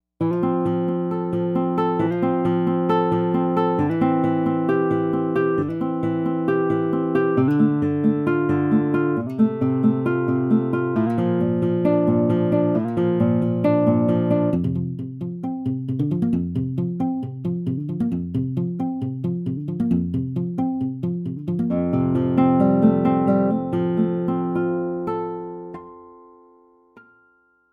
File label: nylon guitar; jupiter-80